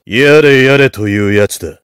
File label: heavy positive vocalization